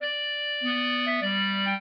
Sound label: trio, clarinet; Mozart